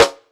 Snare Drum; Drums - Snare

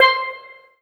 Yamaha TG500 TP Steel C5

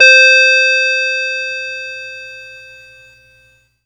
Yamaha PSS-170 Baby Doll C5

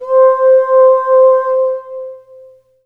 Yamaha PSS-280 Female Chorus C5

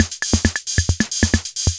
Casio MT 45 Samba

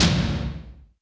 Roland R-8 Doom Tom

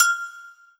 Korg N1R High Agogo

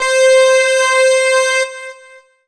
Yamaha SY22 VCO Lead C5

